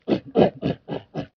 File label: Tusker Laugh